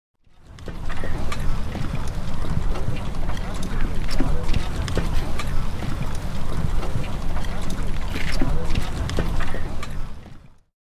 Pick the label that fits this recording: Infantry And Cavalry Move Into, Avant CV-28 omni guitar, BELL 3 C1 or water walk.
Infantry And Cavalry Move Into